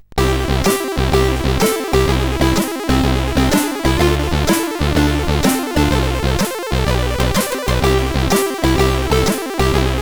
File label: sid, CSG8580R5; Minjas